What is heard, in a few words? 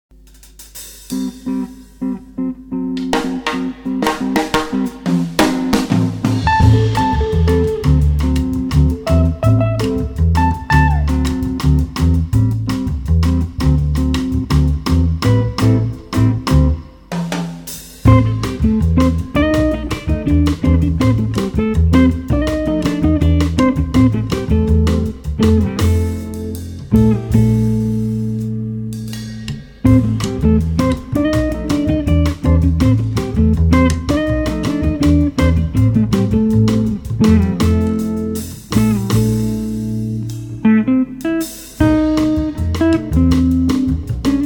latin jazz trio